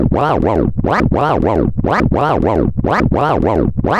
voc rap; 12-scratch; Nyquist Example

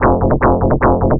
techno, rhythm; nyquist

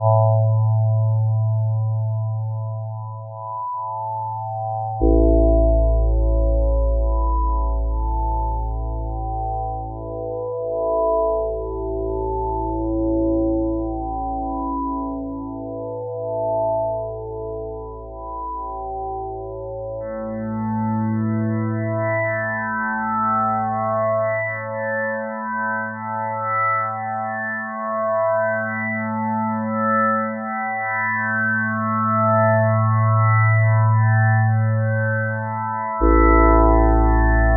tibetan; nyquist; pmorales

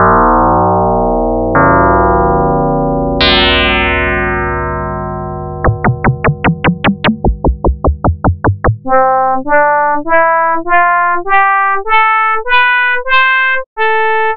fm synth; nyquist; pmorales

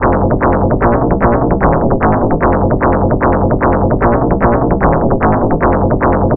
bassline; nyquist

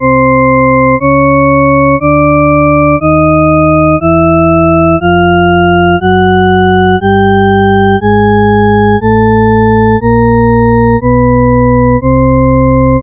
paradox, shepard; nyquist

